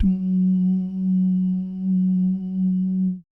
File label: BS DUMM, Vocal Planet Jazz & FX; ILIO; BASS DUMMS